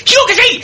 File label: fire; kakka